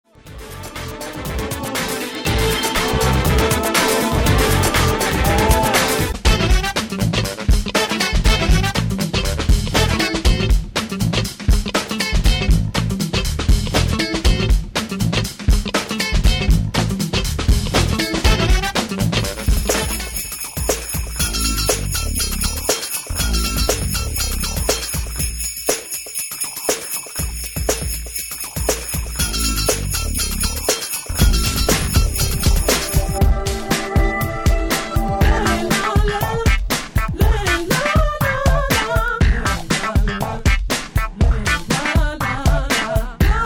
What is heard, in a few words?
exercise music